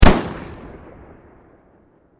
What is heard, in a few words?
Pistol Smith Wesson 357 Single Shot Exterior; Warfare